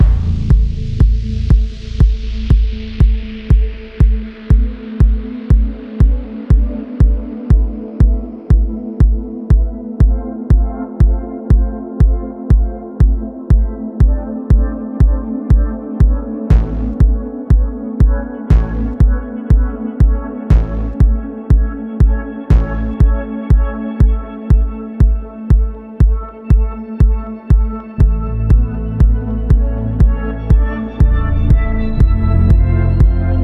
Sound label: music chill loop; Necrobarista Final Pour